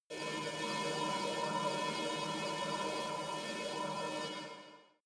space alien fabric machine energized

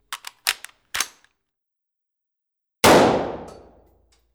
ar15 load and shots

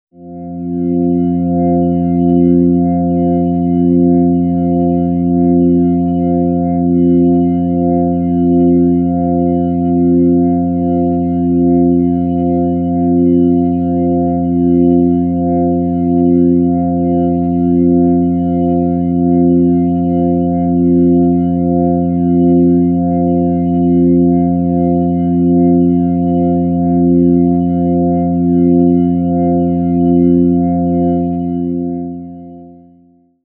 organ chord neutral f

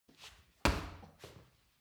punching bag kick hard

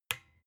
desk lamp off soft

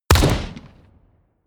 shoot close

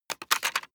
plastic device handling rattle

